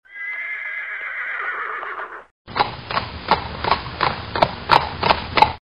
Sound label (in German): pferd